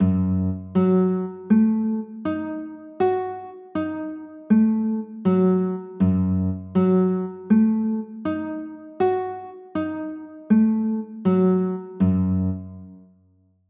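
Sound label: G flat m9 chord, guitar; g flat m6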